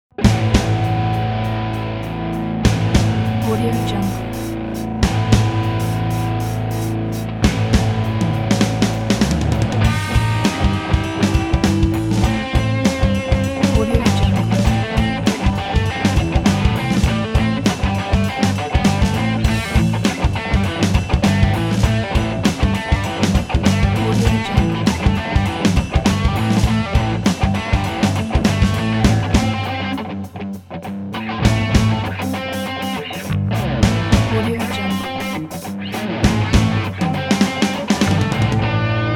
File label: heavy funk rock